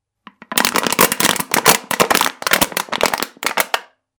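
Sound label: jars sound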